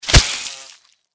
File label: sting attack; npc; cazadore